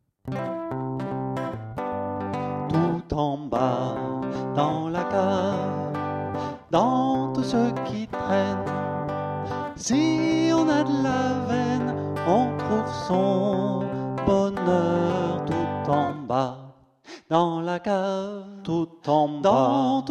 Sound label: canon cave